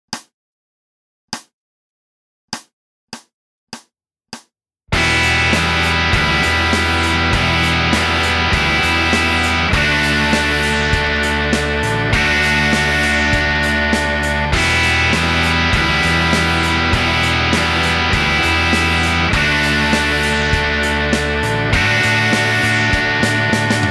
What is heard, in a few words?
rock, guitar; rgt-initial-stage; missing in action